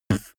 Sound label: beatbox bass drum damped